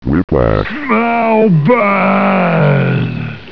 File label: whips bad; quake2; tigger